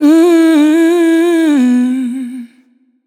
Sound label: vocal adlib rnb mmm Bm